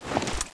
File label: janus11 draw, weapons; cstrike